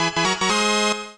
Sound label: Jingle Game Clear; game builder garage 2021 switch